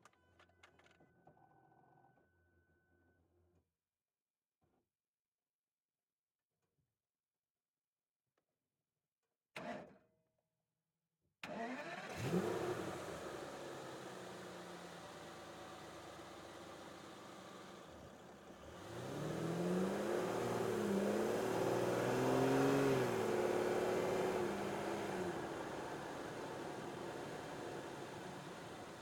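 Aston Martin DB9 ONBOARD MEDIUM Start Drive Stop Reverse Stop Drive Horn Stop Off; Aston Martin DB9 2005; ENGINE; Pole Position Production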